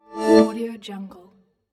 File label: transition woosh